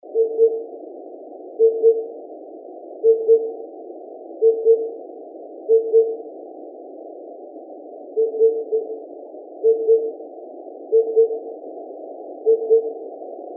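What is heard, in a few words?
tutudori S, Oriental cuckoo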